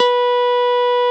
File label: Jazz Guitar Eb3